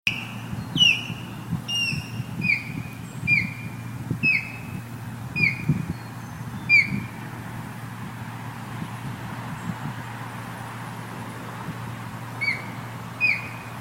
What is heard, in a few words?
osprey calling its mate amplified